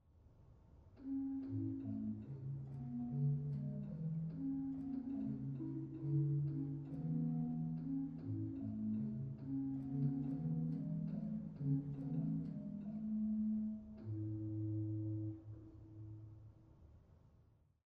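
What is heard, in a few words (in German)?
Pedal Bassfloete 8, organ; dortmund; Sauer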